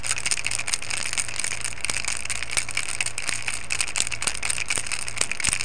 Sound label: Sound Rattle